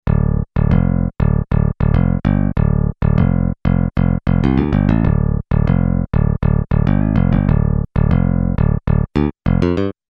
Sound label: bass